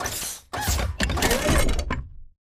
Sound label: bt combat walk to idle; Titanfall 2